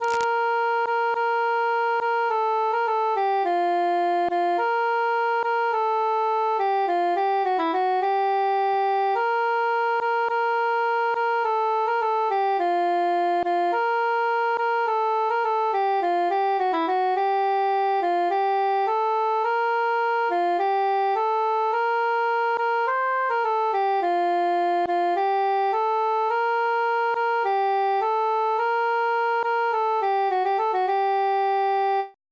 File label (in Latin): cantus solo; pastime with good company